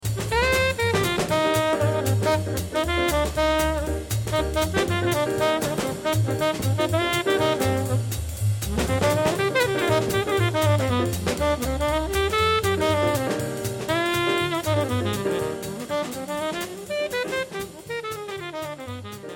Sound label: solo